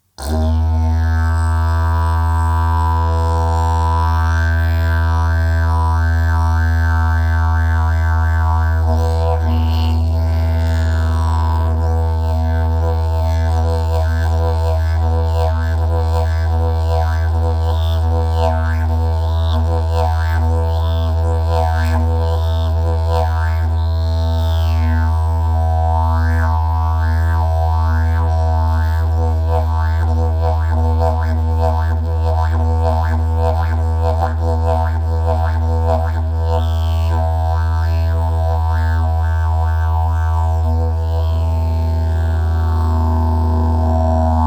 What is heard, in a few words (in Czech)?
briza korenac, didgeridoo